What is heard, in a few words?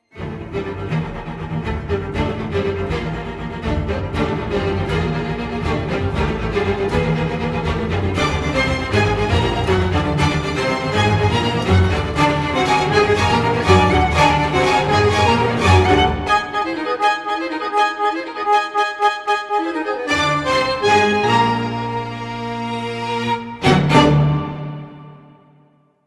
Concerto for Violin and Bandoneon; Juan Pablo Jofre Double Concerto for Violin and Bandoneon